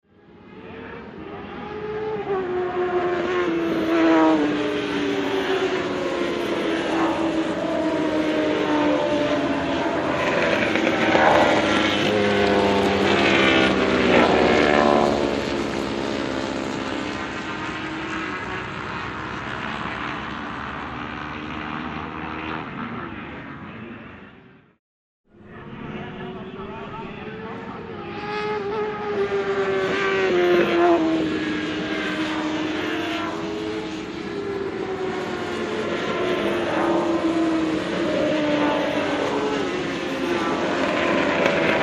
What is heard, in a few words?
Races, motorcycles pass by; motorcycle racing